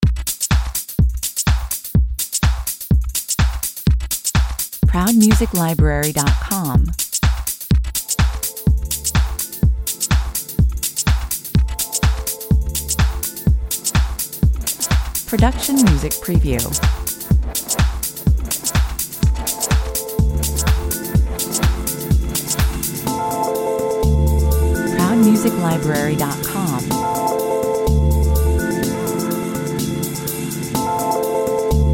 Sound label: disco 125 bpm